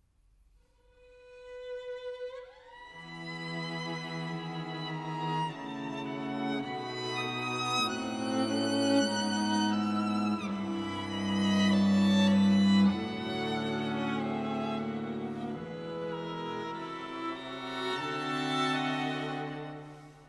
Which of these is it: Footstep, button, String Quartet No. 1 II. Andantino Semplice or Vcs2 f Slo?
String Quartet No. 1 II. Andantino Semplice